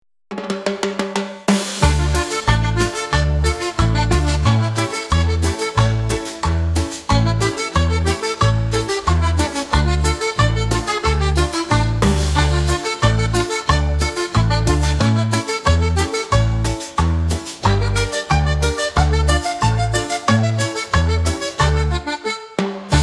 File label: tango